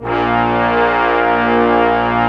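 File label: Section, Brass Large Ensemble long; Roland L-CDX-03 Disk 2; Orch.Ensembl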